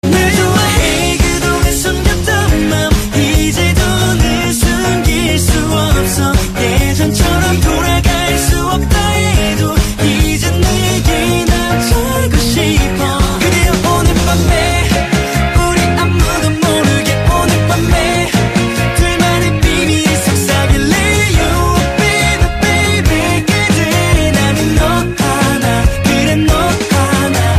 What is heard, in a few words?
melody